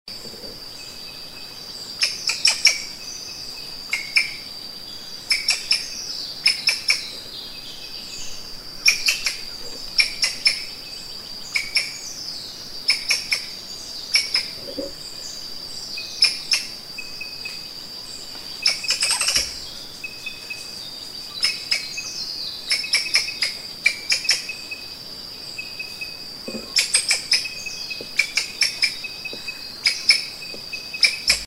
Funisciurus atherynus Rope Good, Thomases Rope Squirrel; Sangha Squirrel; Squirrels of Western Africa